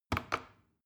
plastic button small click heavy slow

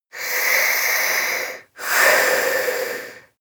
breath female sleep hiss

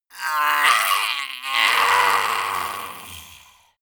monster die high

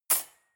cutlery cake fork drawer place hard

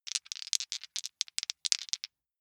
big two rattle in hand

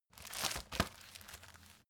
folder file jacket turn hard

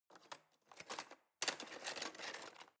knives wood drawer grab

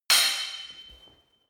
sword hit sword single

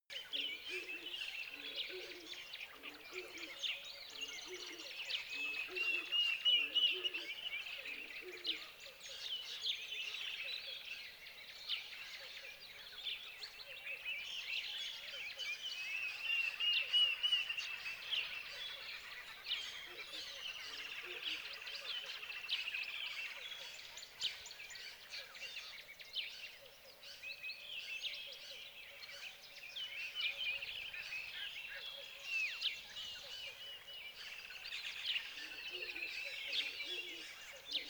tropical river lively settle wildlife

